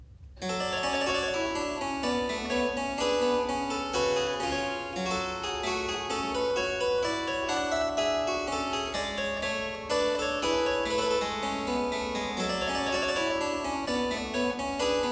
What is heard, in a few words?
Menuet Trio in G minor, Keyboard Works; J.S. Bach; Menuet-Trio di J.S. Bach; BWV 929; Johann Sebastian Bach; Inventionen & Sinfonias